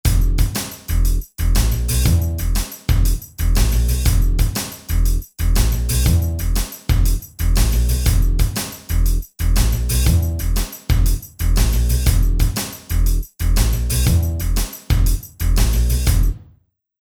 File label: Shuffle